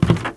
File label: old wood step; Golden Crayon Sounds